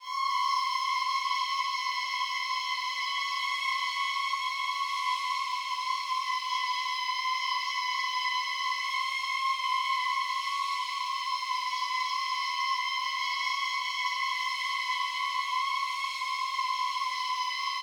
Atmos; neuro; InsideInfo

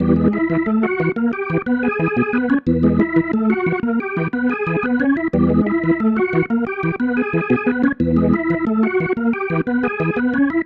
Pattern, Organ

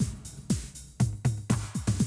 Classic Drum Loops

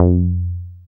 Bass SH-101

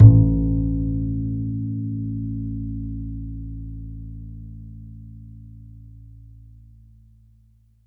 DBASS A; Univers Sons; Jazzistic

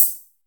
FILTER CHH, TECHNO; Drums In Your FACE!

